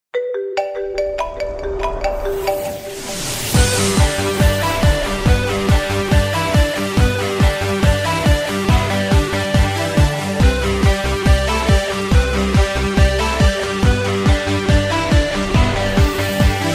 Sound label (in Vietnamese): Nhac Chuong Iphone Remix Rock